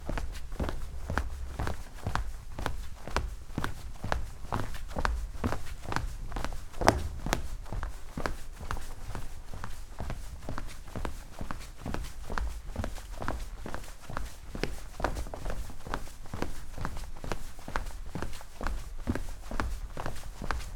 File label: Hardwood Walking Looping